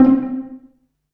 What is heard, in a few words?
PIZZ, Dance Mega Synth